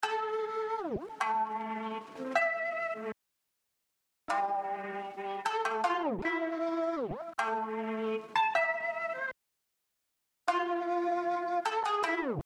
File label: Riff